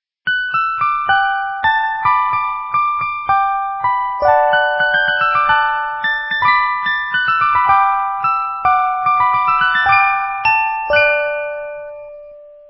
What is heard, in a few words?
melody line